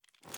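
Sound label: charge reload fast start, pistol; gmod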